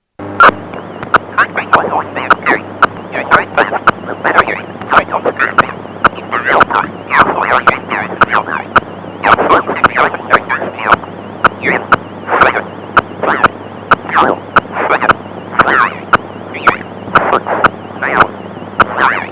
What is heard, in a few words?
scramble; rci 1000